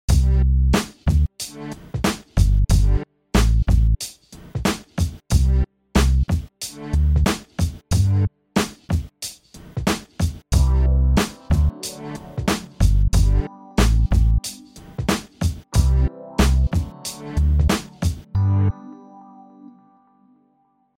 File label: BEAT